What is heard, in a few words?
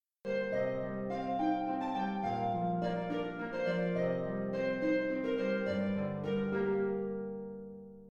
Dorian, scales Minor; Greensleeves